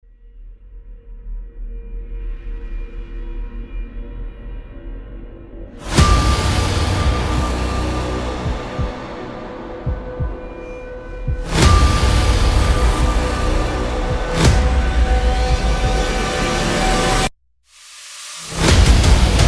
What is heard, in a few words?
Instrumental